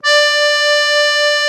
AKK VIOLIN, akkordeon

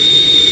jet anfang; Amiga Soundtracker Sample Packs

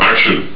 hatschi; Amiga Soundtracker sample

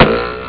pfeile; ST-XX Amiga Soundtracker Sample Packs

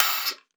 LUFT AUS, OB D.AMORE; Xsample VOL-7

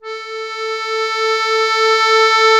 AKK VIOLISW, akkordeon; Best Service; Alpine Volksmusik

